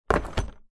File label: industrial power tool zip case set down on table